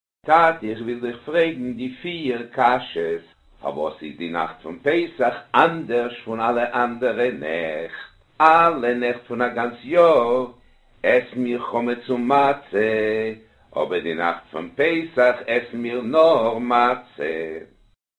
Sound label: manishtana yiddish, Chants du Seder de Pessah